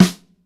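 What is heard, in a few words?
dry snare drum, acoustic set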